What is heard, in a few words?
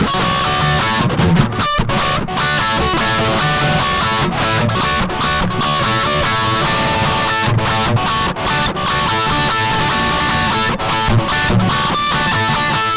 Guitar Licks; Best Service; ProSamples vol.17; AKAI